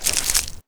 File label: foley chemlight shake